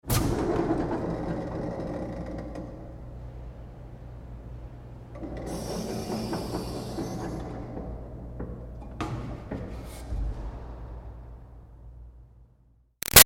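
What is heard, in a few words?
Door Metal Commercial Pull Nice Hinge Open Close; Metal Doors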